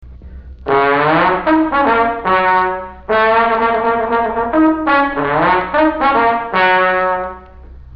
trombone

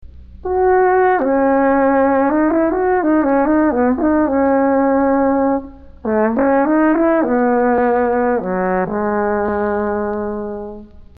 baritone horn

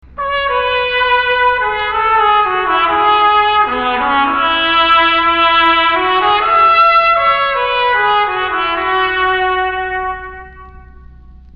cornet

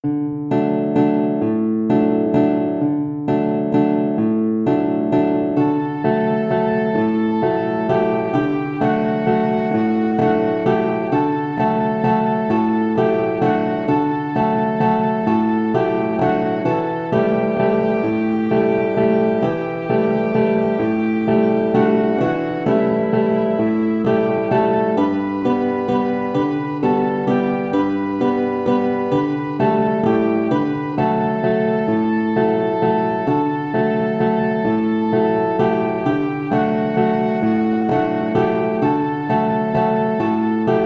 De Colores in D recorder

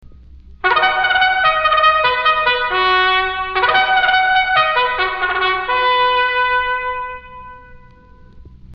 Herald trumpet

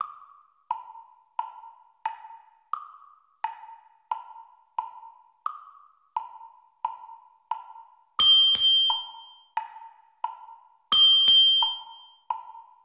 Ancient Spirits - mallets practice track; Honor Band; Bells only soundtrack (at #18)

